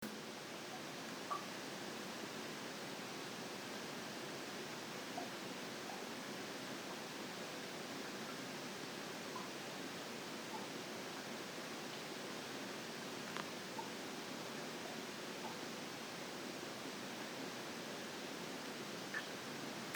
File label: xylo